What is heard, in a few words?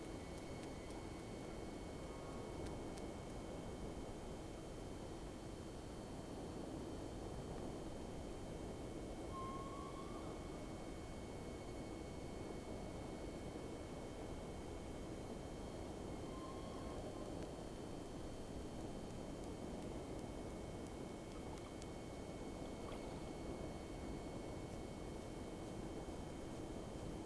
Whales; SeaSnake